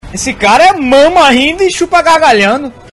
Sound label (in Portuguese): mama rindo